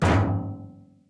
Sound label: wardrobe attach outfit tinman; run-dorothy